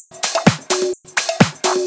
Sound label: Percussive Loops 128BPM; Vengeance Samples